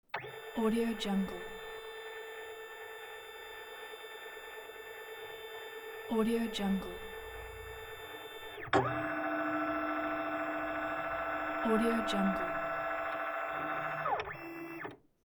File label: scanner